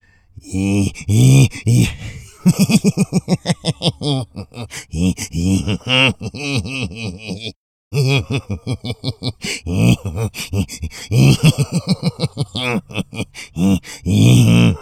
Funny Psychotic Laughing

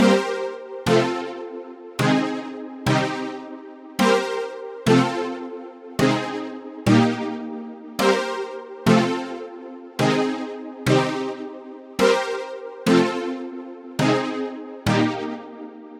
Synth Loop 120 Bpm Melody Loop

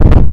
Fall

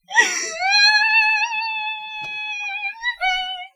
Long Whimper

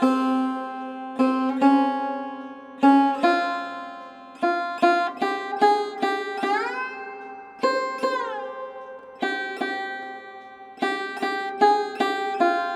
Sarod Riff

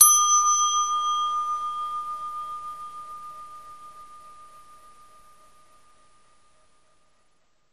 Elevator Bell

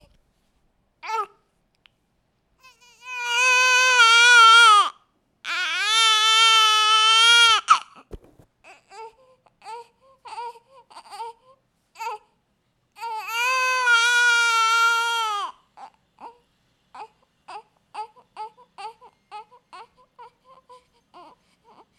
Female Baby Cry

